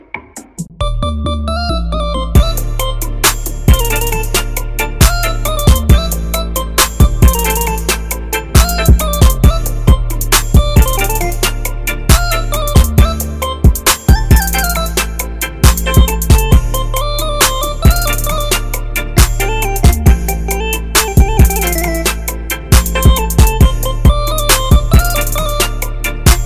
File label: Romania Instrumental